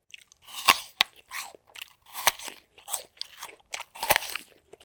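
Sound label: apple crunch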